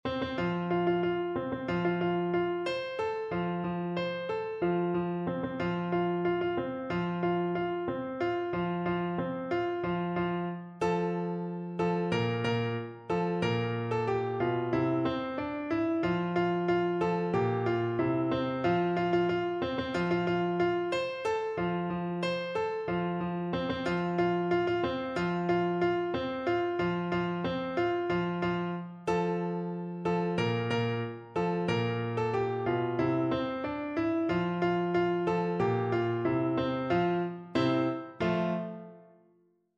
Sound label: ten in the bed piano